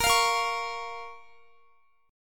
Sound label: A sharp Minor A sharp down Guitar; A#m; strummed